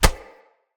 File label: Unarmed Punch Impact Flesh; Weapon Impacts; Player; Combat